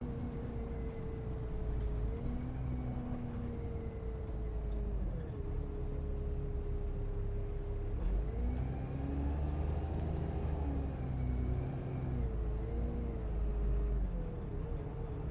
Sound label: urban bus journey interior perspective newcastle upon tyne uk